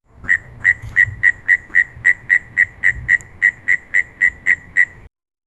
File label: frog sound in a forest, croaking